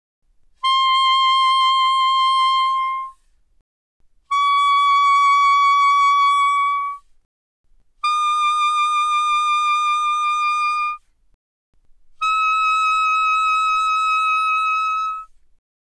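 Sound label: Soprano Sax Vibrato mf C6 Eb6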